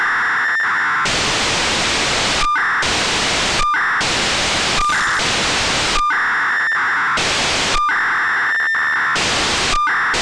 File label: 1k2 FFSK; snet